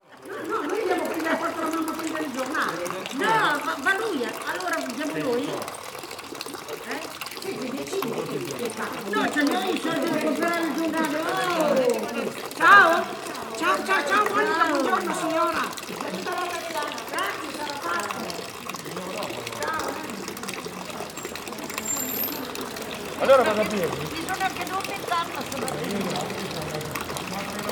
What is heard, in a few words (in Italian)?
chiacchiericcio e fontana; passeggiata sonora; Un modo di esplorare un territorio fondato sull'ascolto